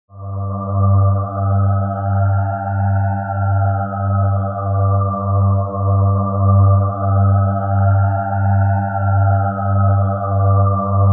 Forma Pad Mod, High quality thriller-horror ambience sound perfect for building tensio; Scary Soundscape; perfect for building tension and drama in film or game scene; Forma Fad Mod